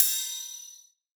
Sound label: Trap Ride; Cymatics